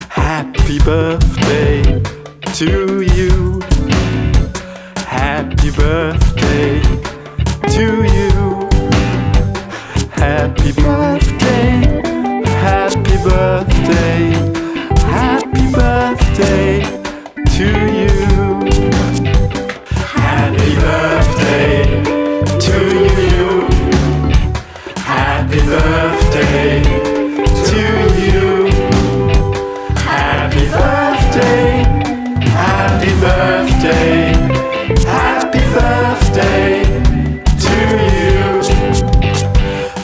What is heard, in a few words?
Happy birthday english